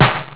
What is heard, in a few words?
slap